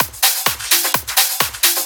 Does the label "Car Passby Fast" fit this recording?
No